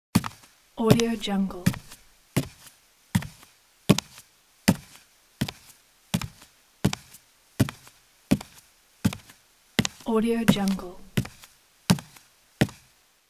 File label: footsteps